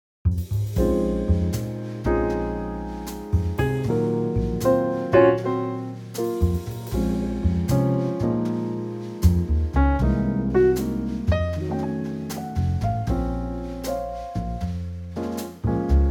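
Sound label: Bb minor Trio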